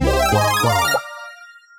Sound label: Powerup Penguin Suit